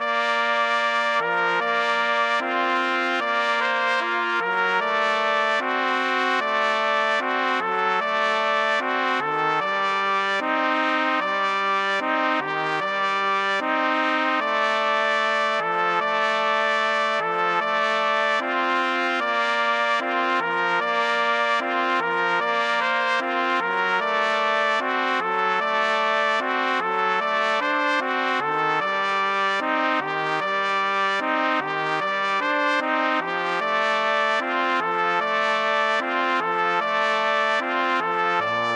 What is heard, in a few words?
processional